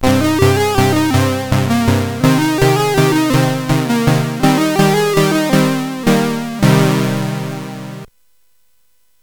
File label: Loopz, CSG8580R5